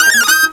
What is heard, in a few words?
C FIDDLE, FAIRLIGHT CMI IIX STRINGS